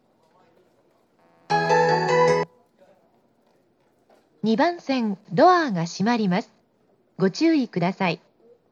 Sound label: melody; ryogoku